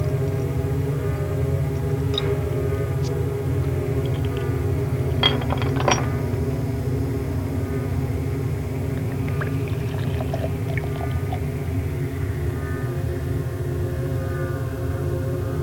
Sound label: pour o glass